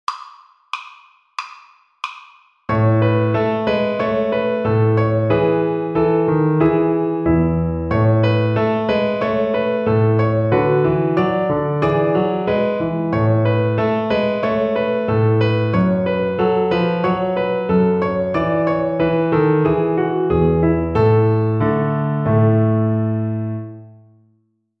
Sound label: String Gym, Piano Accompaniments